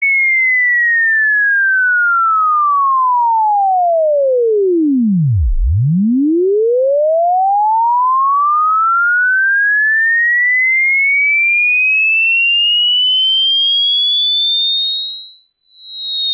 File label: Swept Sine 20-4KHz